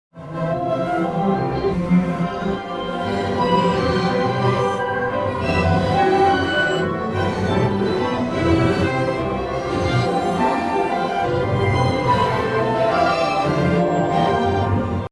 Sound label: in C Compressed